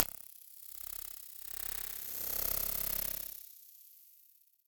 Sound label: GUI, Cinematic Sound Effects